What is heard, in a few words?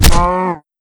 axe hit flesh; weapons; tf2